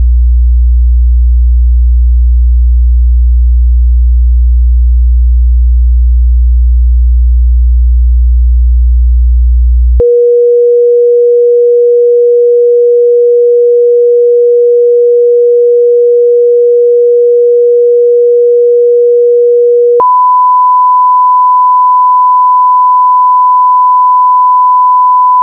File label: tones